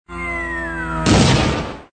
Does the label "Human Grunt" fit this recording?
No